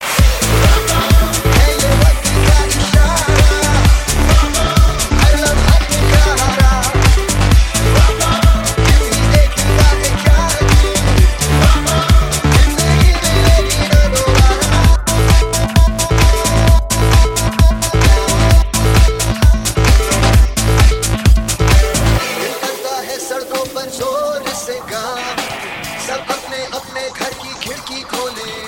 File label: rock